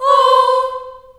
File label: C4 child oh, Choir; Acoustic & Synth; USB Soundscan vol.28; AKAI; CHILD OHS